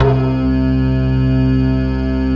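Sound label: DIGITAL CHASE; Greytsounds; Scott Peers Sound Engineering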